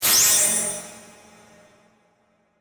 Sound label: Transition Hit Impact Electrical; Production Element